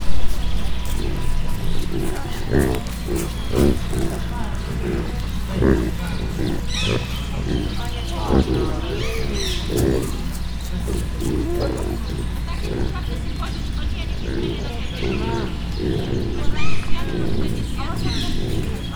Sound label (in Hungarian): balról jobbra morogva elhalad, örvös pekari; miskolci zoo 2018